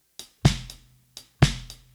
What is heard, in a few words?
Drums, 70s Analog Funk; Sonic Foundry (Sony Creative Software); What it Is!; Classic Caddy